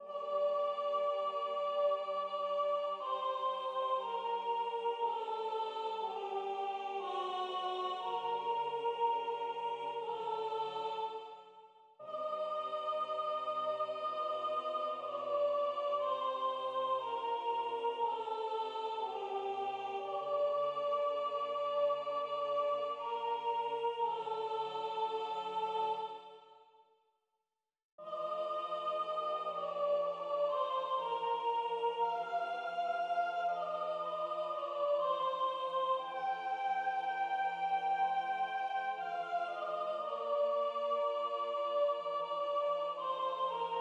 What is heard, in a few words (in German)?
Nacht ruht Sopran